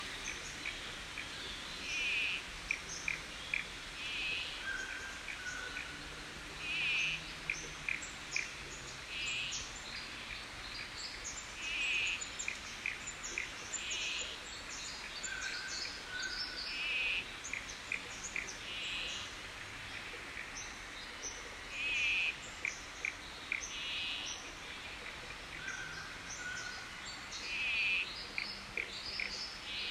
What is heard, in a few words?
frogs croaking joalah national park